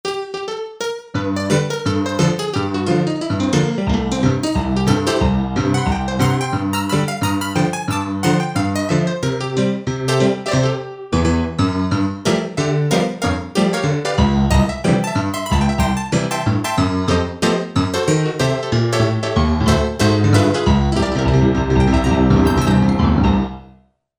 Saloon, Korg MSC-8S Percussion

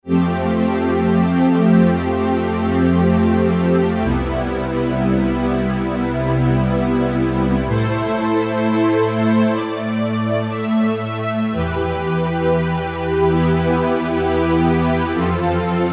Ripper, Yamaha TG77; Factory Presets

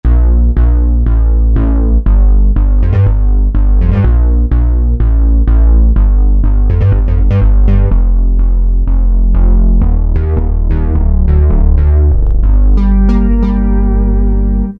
Fat Mini, Alesis QS8; Factory Preset examples

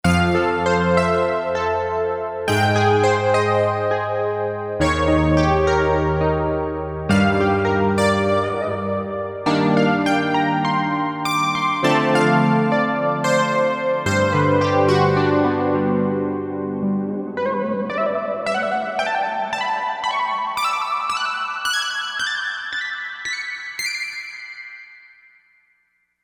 Phase, Korg MSC-2S Synth; Synth 1

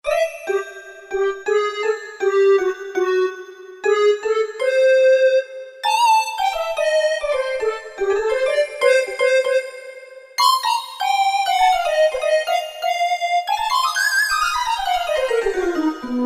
Hollowed Harp, Roland D-50; Factory preset demos

